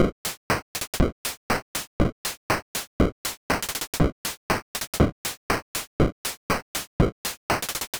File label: Heat Of The World drums